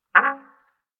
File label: creaky short; everyday objects